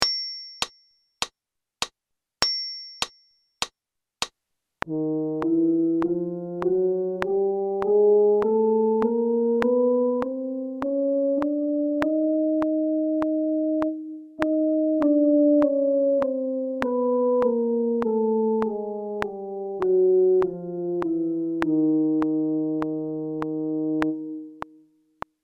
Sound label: Chromatic in F count in, brass, practice